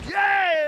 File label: "yeah" sung